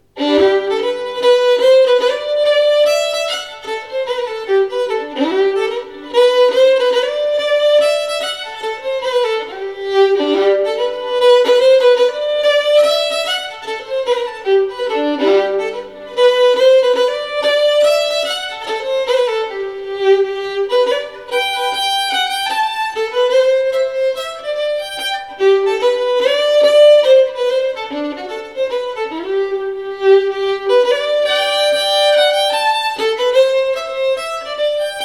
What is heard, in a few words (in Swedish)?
Polska Sarna; Polska Särna. Låten vi spelade